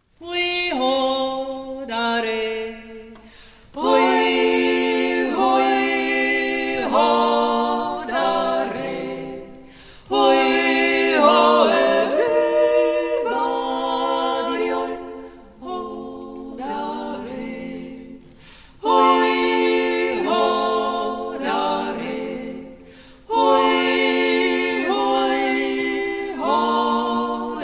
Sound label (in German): Jodler; Wir wollen Bruecke sein